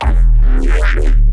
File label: Reese; neuro; BIG5AUDIO